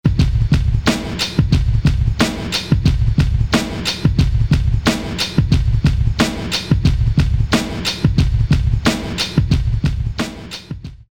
Phat Beat, Alesis; Alesis Rap Techno Dance

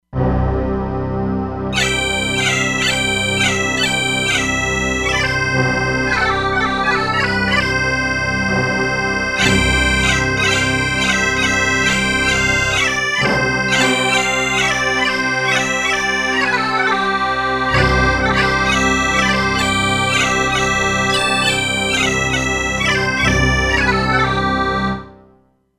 Bagpipe, E-mu Proteus-3; Factory patches

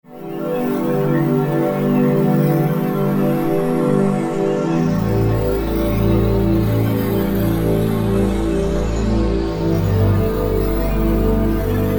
SYNTH TEXTURES - Surround; Tonga Surround; Apple GarageBand 2

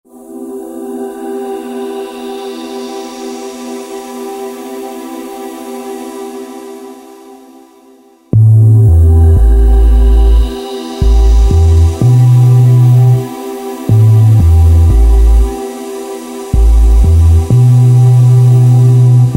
Deeep Bass & Pad, Korg TR-Rack

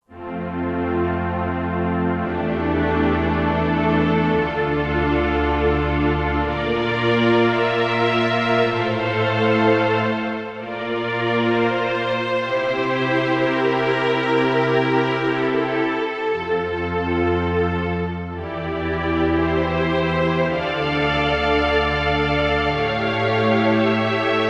Rich Symphony, Roland SR-JV80-16 Orchestral II

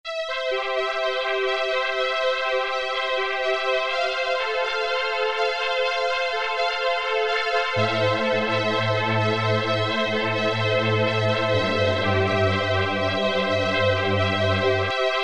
DETUNED LONG PIANO, Oberheim OB-8; Factory Patches

